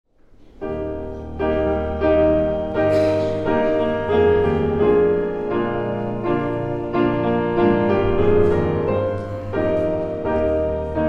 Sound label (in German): Vorspiel